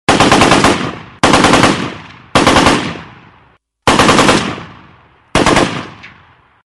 Firing

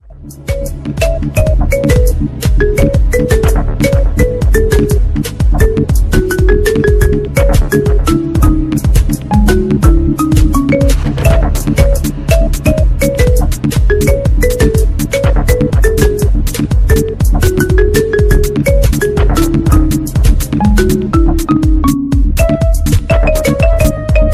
Infinix Note 10 Pro, ringtone

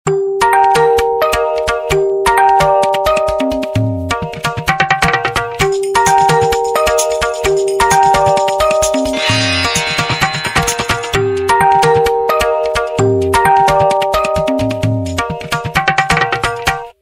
Original Oppo, ringtone; A7

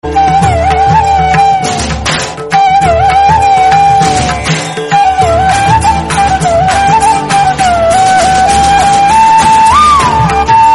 Best Flute

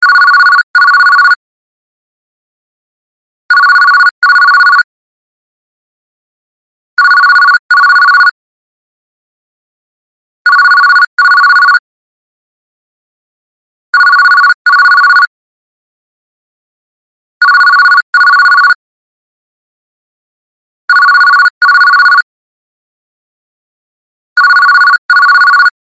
Landline Phone Ring

